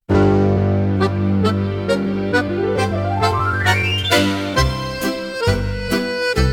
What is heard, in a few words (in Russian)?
New Припев 1 аккордеон, Jazz for piano